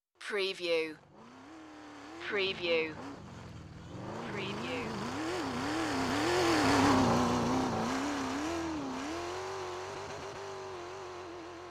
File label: Chevy V8 Hot Rod Drive By